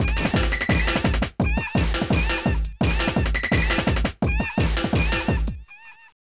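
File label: drum loop, techno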